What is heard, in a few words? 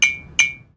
wink blink; drawn Together